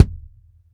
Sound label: BD, Bassdrums, Roland TD-20